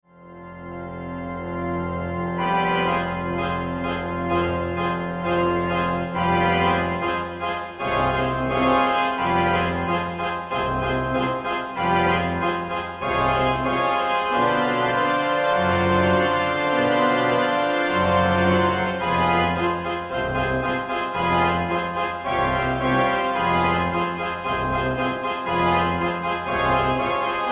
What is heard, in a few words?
lemon music; svencoop; keenhalloween